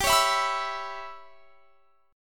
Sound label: G 6th Flat 5th; G6b5 strummed